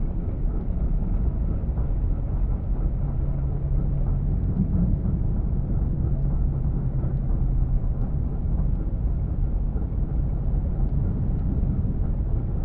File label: ship battleship idle; aquatics